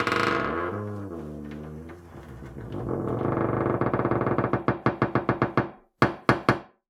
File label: Door Creak